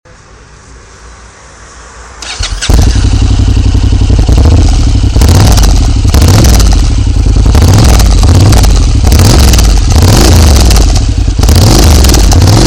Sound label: Honda NC700